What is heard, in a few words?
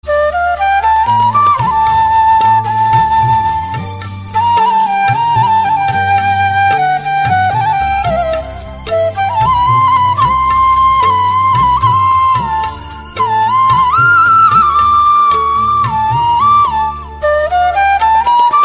Flute